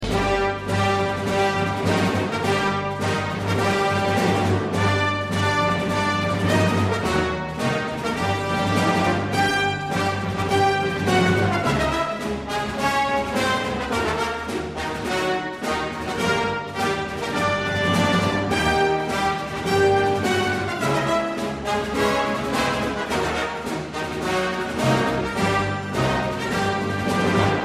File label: short march; ringtones